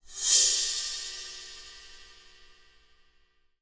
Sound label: BR CYM SCRAPE, drums; KITS-LARGE